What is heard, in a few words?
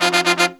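Pop & Funk Brass, 110 F, right; Best Service ProSamples vol.25; AKAI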